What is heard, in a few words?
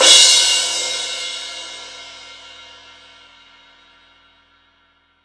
crash; East Collexion; Drum 1 Dry